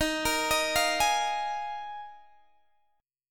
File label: Eb Suspended 2nd Suspended 4th, Guitar, Open D